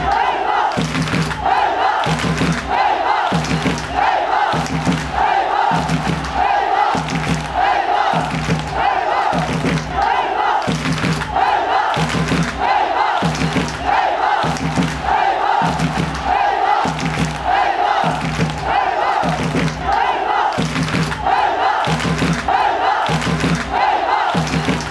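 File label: bombos fanchants, football chants; eibar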